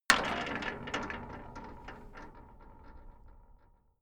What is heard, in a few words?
metal gate close rattle